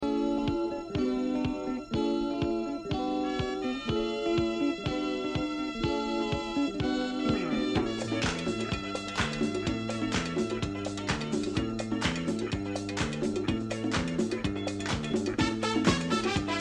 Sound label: everybody salsa